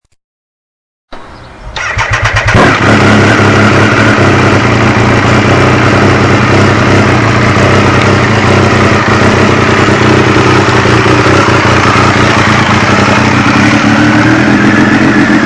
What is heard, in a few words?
Triumph 955i open pipe